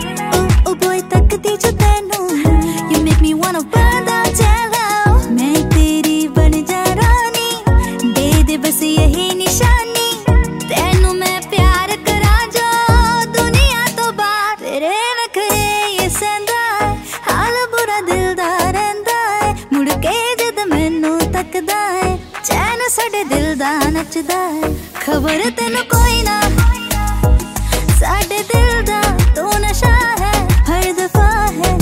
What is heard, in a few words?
Female Version Ringtone